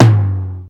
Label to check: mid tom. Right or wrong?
Right